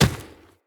Infested Medium Run Dirt, Footsteps

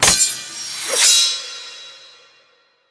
Knock Sword; Teshin; Warframe; The War Within; Nursery; Cinematics

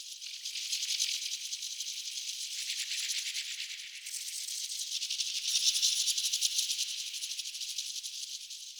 Landscape Insect Loop, Stereo

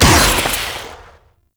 Shot, Infested Beam Pistol; Coda Catabolyst; Weapons

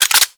Spear Gun Reload; Hoplite

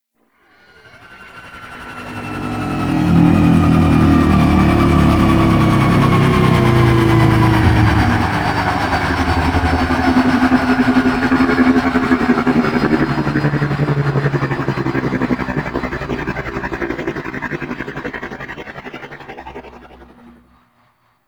Asteroid Field Ship Wind Down; The War Within

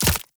Brood Spider Land; Warframe; Oraxia

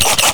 Flying Robot Engine Stop; Warframe; Veilbreaker; Ambience; Kahl Quest

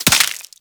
Headshot Impact Flesh

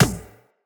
Beach Ball Kick; WaterFight; Beach Ball Floof

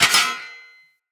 Long Sword Impact Block Defender

